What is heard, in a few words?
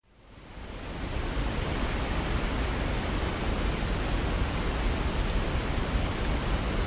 water sound